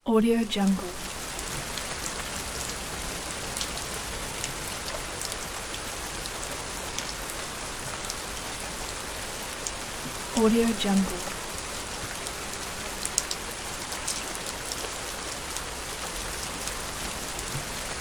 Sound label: light rain